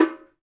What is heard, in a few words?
Bongos; Spectrasonics; Supreme Beats; World Dance